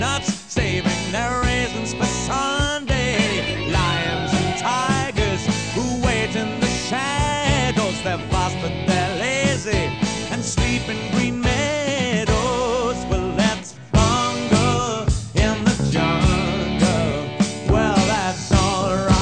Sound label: rock